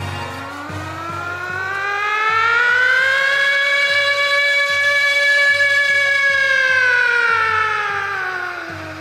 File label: siren; eli conifer